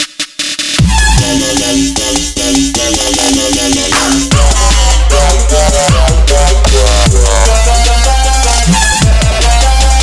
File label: phrygia edm